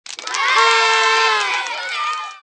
Party

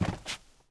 carpet; ambient

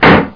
bang

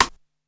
snare, Drum+Bass, 160; eJay

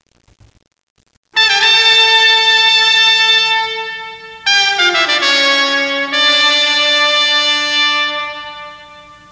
brass

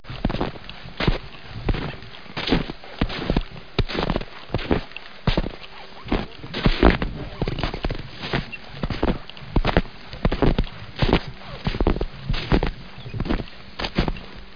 SNOWALK

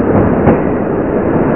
subway; Duke Nukem 3D Kill-A-Ton Collection

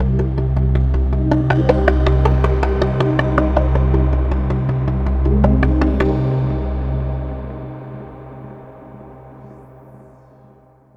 AMBNT 160; Ambient

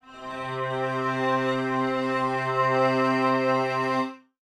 String Ensemble C3; Pro Sounds Sample Collection

